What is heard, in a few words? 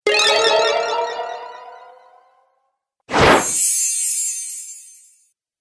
Magic Spells; Magic Sound Effects